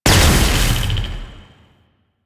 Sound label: Wave Ability Proj By Explosion; Enemies; Abilities; Warframe; Duviri; Dax Knight